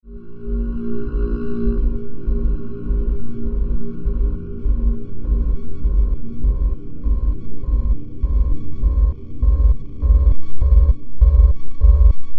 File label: Drone Mass